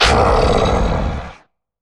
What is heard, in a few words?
troll monster growl